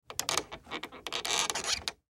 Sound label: Hammer Pull Nail, Hammer Pull Nail From Wood; Hammers; Tools; Industry